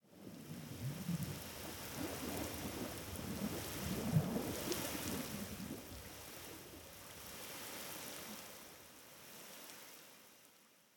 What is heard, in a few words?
victory surf; smite; pele